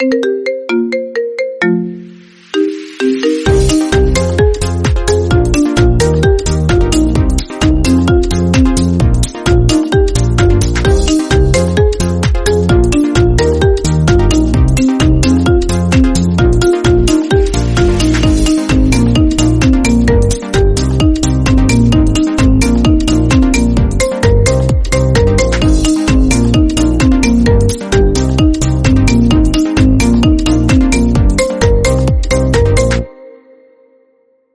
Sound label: marimba mix ringtone